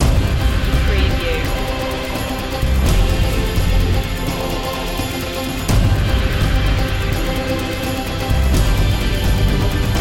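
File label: background choir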